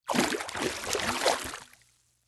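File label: shark sounds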